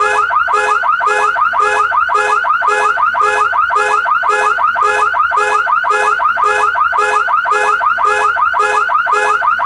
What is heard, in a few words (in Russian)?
zvuki avtomobilnoi signalizatsii